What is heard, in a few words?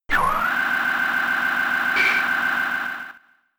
Whirlwind; attack moves; Pokemon; Gen 4; DPPL HG SS